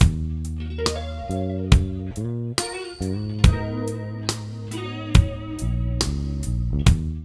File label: MIX, Funky Ass Loops; East West